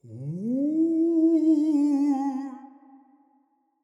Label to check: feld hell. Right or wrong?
Wrong